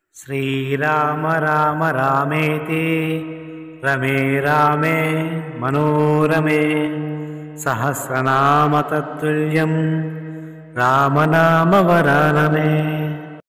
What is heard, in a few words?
Ram Taraka Mantra